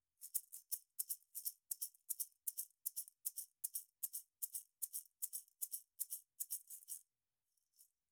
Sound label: Shaker MXL V6